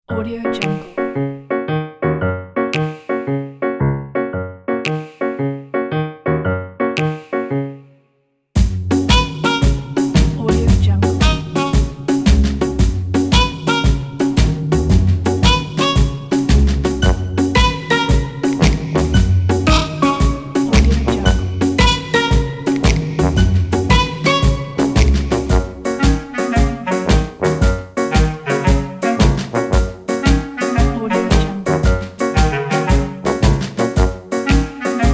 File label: comedy boogy